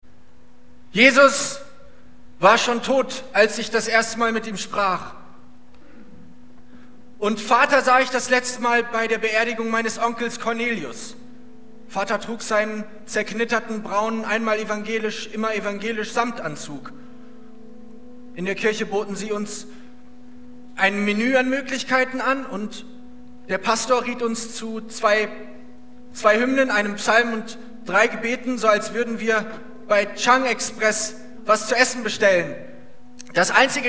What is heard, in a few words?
Voodoo Waltz